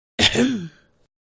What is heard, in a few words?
throat